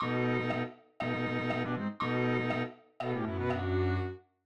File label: strings